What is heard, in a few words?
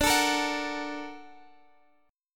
Bb Augmented Major 7th D